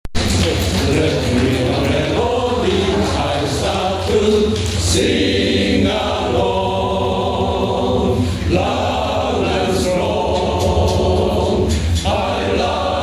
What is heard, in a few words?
Sing Along